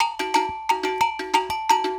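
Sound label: 120 COWBELL; Best Service Advanced Media Trax Modern Composer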